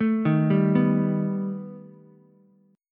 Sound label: B Augmented 7th B down Ukulele; arpeggiated; JGuitar